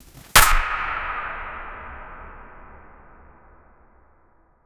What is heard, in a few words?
sound of a knife stabbing; magic